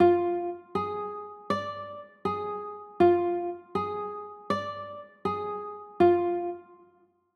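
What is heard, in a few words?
G sharp dim chord, guitar; g sharp dim7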